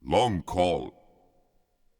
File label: long call